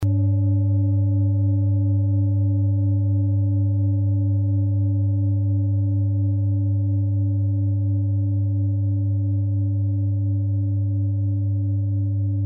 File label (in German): klangschale ladakh